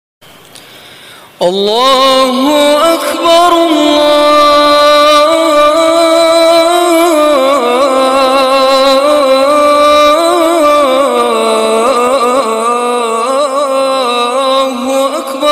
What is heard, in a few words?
Azan